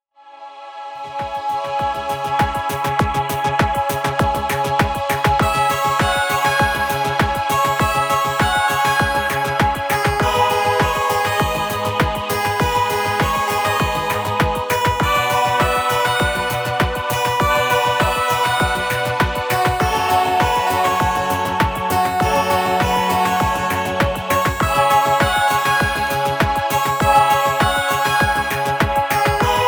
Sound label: Behringer Solina VC 340